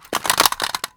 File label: mice wireless drop; Genius; NS Studios - ultra sound collection